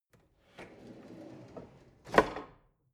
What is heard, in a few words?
drawer narrow open